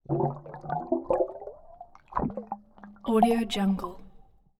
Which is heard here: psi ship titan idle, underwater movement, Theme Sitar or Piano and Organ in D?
underwater movement